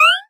jump; misao

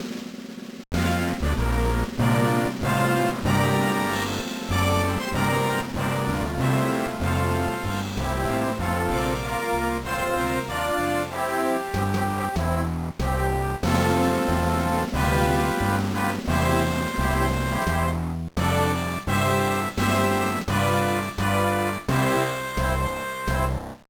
BGM

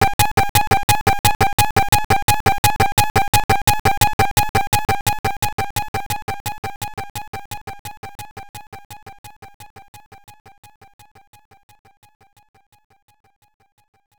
Warning; V-Tetris; Virtual Boy

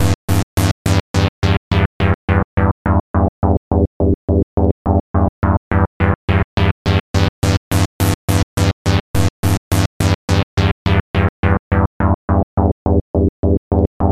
angry moody idle loop; the warriors 2005 ps2

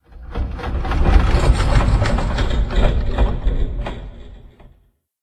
Metal Stress; silent hill homecoming 2008 gamerip pc ps3 x360

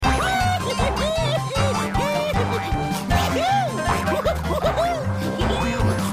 Star Loop; bad piggies; android; mobile; windows

